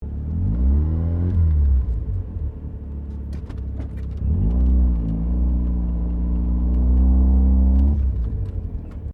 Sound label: CAR MAZDA RX7 SERIES3 INTERIOR MANEUAVER GEAR CHANGE; 1983; Cars (Sound Effects:Vehicles)